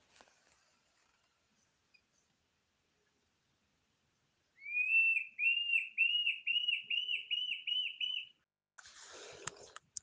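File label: Mackenziaena, bird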